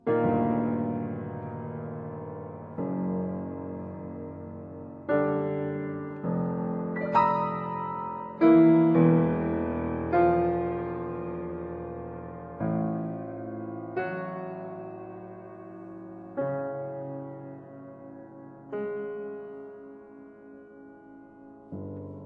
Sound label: Scaramouche Variations III Dark sinister